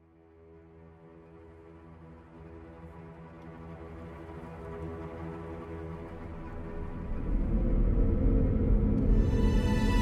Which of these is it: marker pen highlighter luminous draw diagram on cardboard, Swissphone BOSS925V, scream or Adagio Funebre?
Adagio Funebre